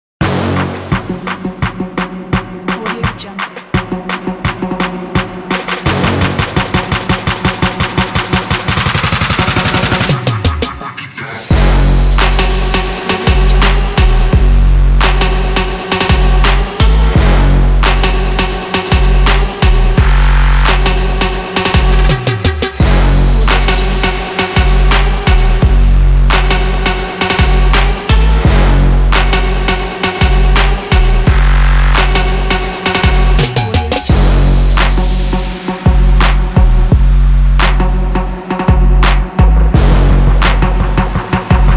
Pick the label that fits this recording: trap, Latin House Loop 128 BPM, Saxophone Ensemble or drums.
trap